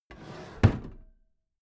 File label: packed drawer smooth clatter close regular halfway